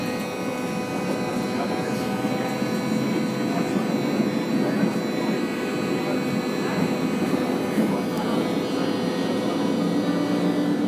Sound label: Metro